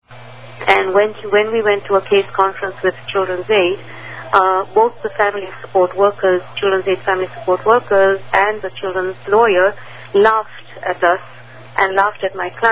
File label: laughed